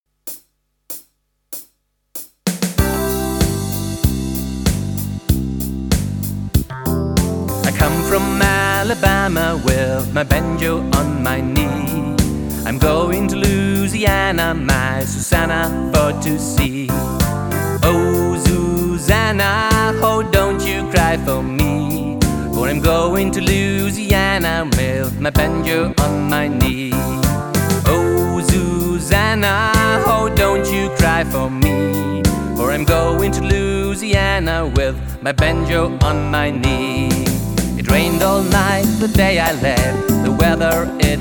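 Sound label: Oh Susanna To play along, ukulele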